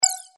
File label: message